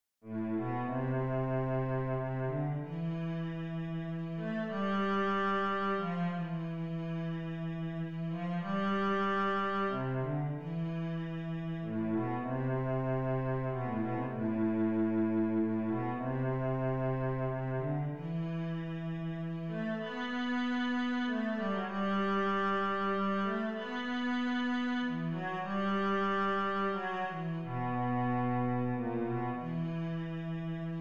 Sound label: barcarolla cello